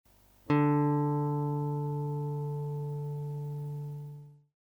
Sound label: gtr d, accordeur, guitare